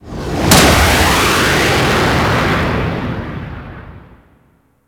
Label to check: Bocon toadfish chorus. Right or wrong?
Wrong